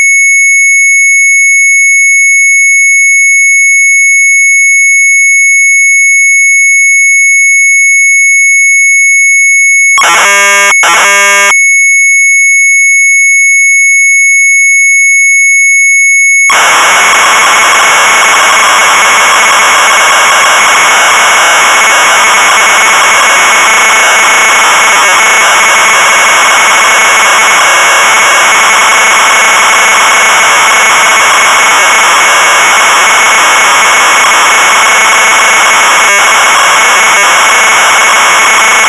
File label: MZ800 Tape; LETTERMIX; retromelsarchive; sharp mz800